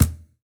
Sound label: reels stop; Beauty Fruity; wazdan; ultrafast